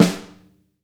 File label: SNAR, Drum Kits